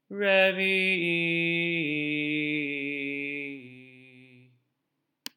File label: Torah Trop Pattern